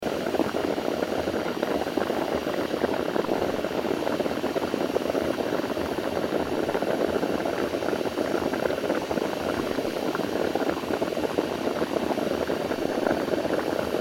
hot spring

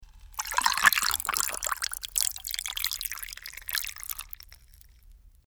ice water

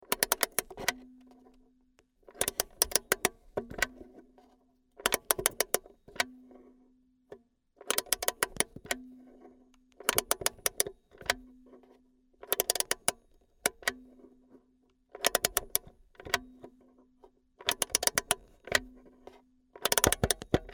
wind spring

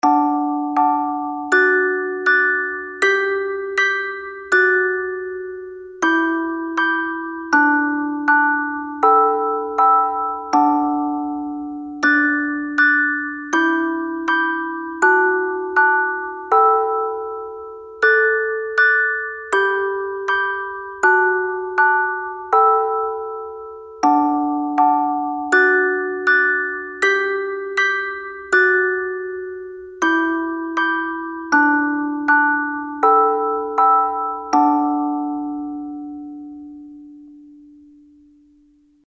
kirakiraboshi mbox

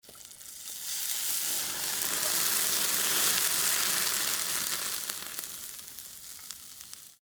fillet steak

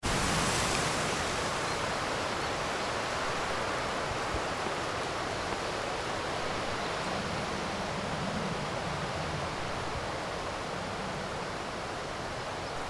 meadow

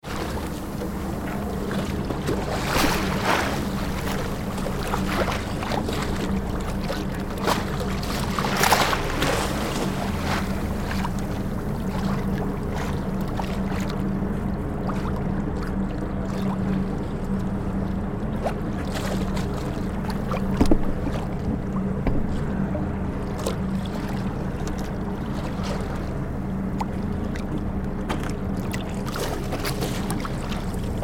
boat wave

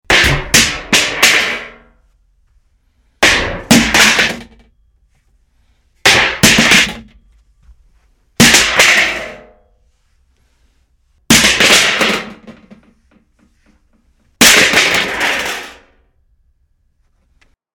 metal fall

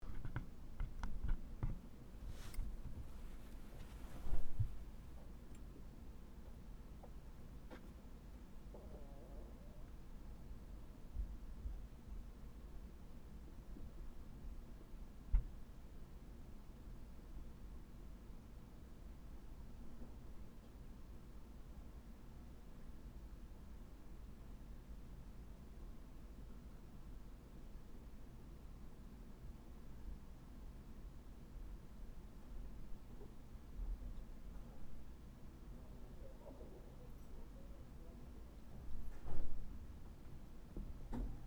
room ambient

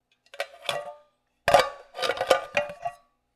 pot

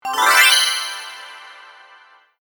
kirarin

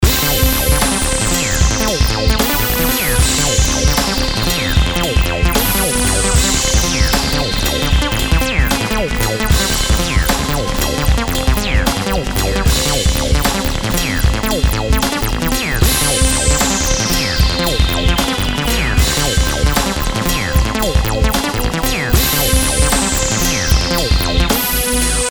tension

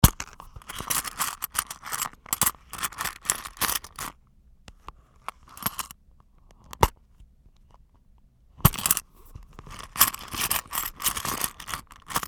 purse

